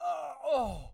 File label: man argoh; angband